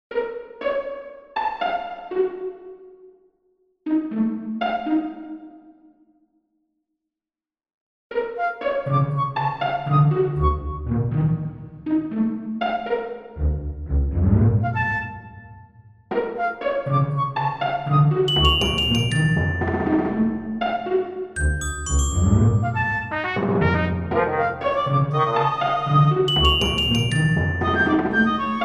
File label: orchestral